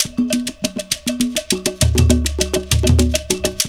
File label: DRUM CAB BELL; Zero G - Ethnic